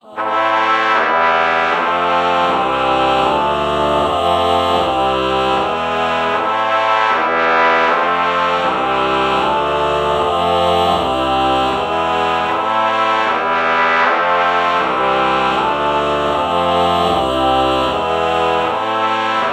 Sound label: ah trumpet chord morph